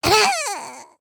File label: Die; Lego Strombie; creatures organic